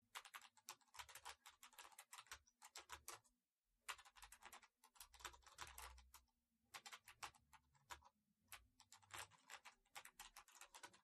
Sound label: Fast Touchtyping, clicks